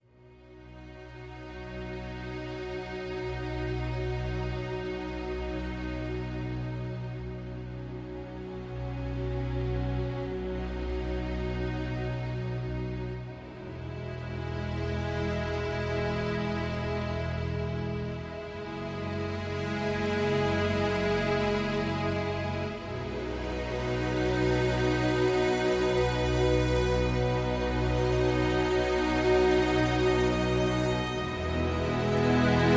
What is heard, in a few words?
No Drums Reduced Arrangement